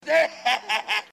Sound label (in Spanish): risa; robin williams